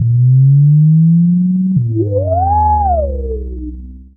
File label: Moog Bass Loop